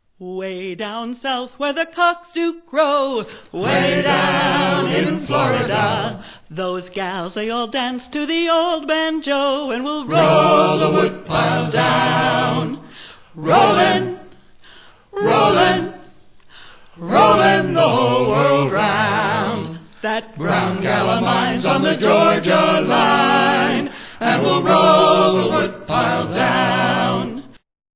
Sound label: woodpile